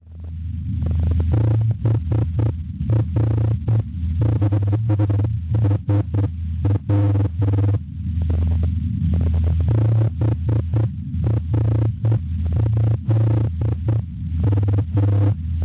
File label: NDB 400 kHz; MSW; Monaco; Schwarzsee